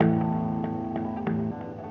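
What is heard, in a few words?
electric guitar, ethno, 125, A; eJay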